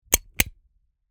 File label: button